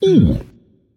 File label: ouch; smite; thor